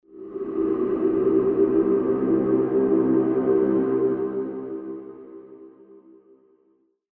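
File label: short ambience; Crysis Warhead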